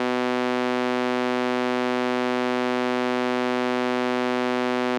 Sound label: buzzer emu